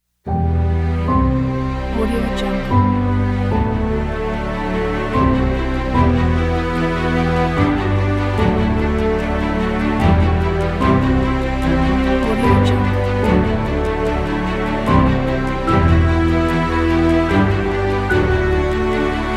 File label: holiday cinematic logo pack; audio-atomy